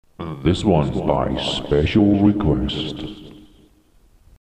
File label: DJ TOOLS JINGLES